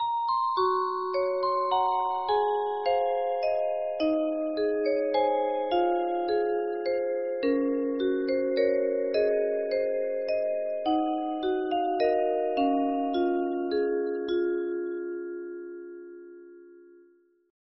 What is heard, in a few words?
Lullaby